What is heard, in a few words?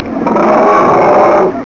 big growl